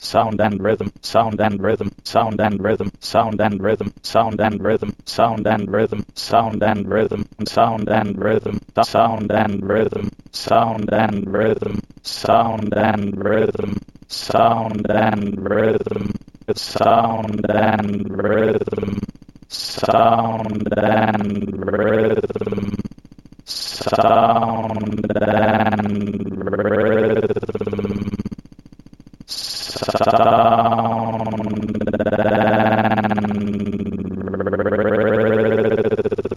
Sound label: timestretch